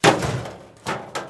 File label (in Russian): Бак